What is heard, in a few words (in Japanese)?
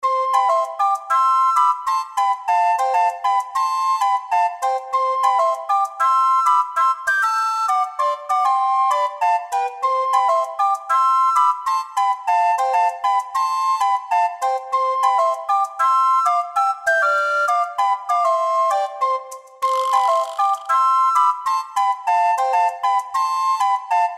リコーダー